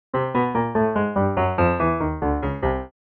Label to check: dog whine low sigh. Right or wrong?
Wrong